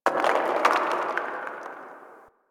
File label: Landscape Rock Slide Low, Ambience; Warframe; Venus Open World; One Offs